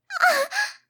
Moan; My Cute Commissar

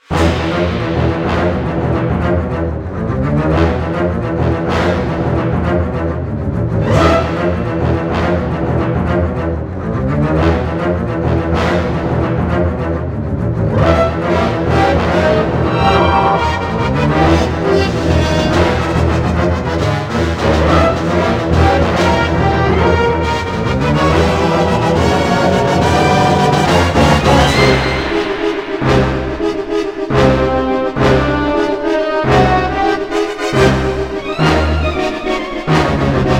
Boss fight

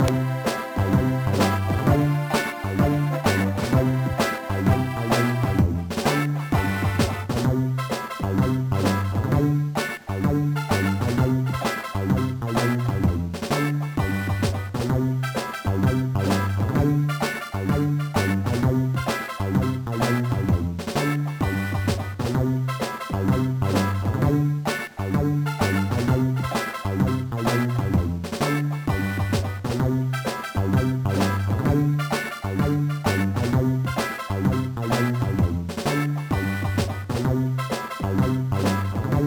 Instrumental

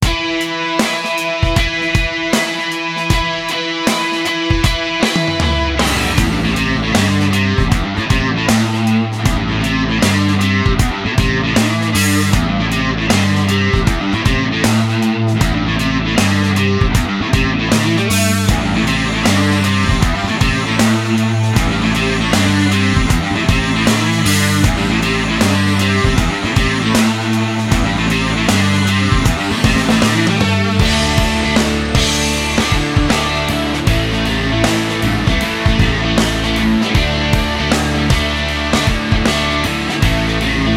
Hard Rock Blues; android ios gamerip 2012; earn to die

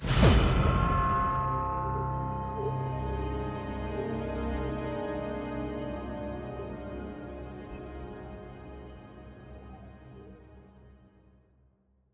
Mus Multi Jingle; Chronicles of Riddick Assault on Dark Athena

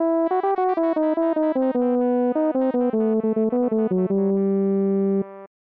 The Horn; Song of Ortah

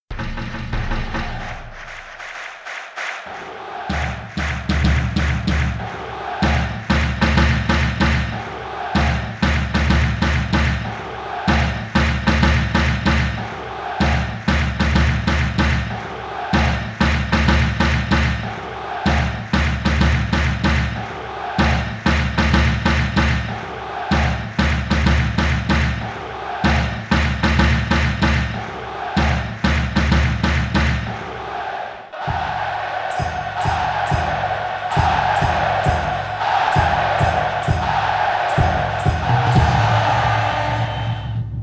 Spectator Chant; Heat of Eleven 98; arcade

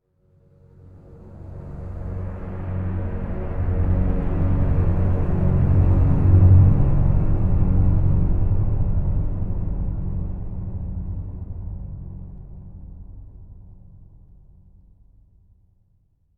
Tense Stinger

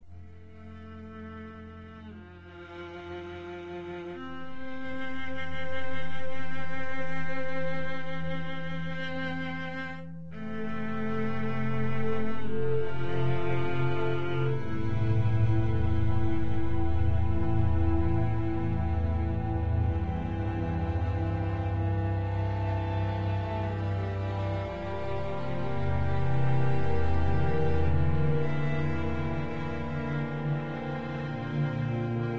loop Sad

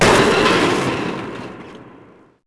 Crash

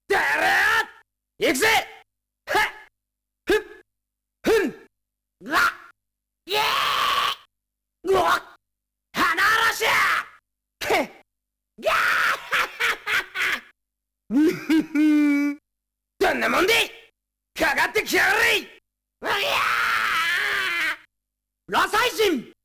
Kabuki Voice; Tengai Makyou Shinden; Far East of Eden; Kabuki Danjuro Voice

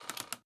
rotating switch

